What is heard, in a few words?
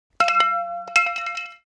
Metal baseball bat dropped